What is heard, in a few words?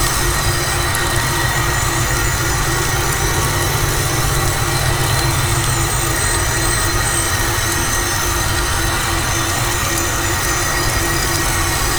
lightray loop